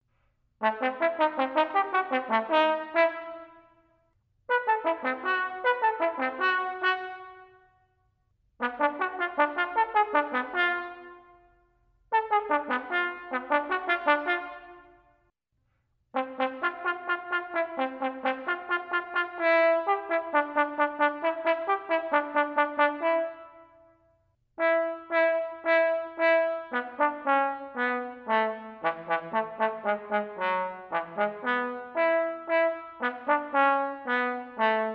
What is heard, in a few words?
Trombone; Petrushka